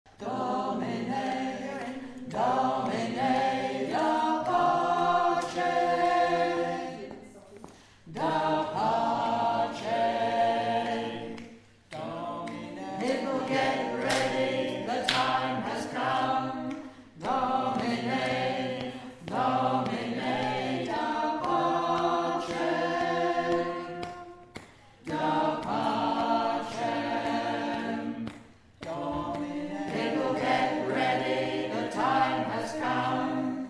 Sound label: Bass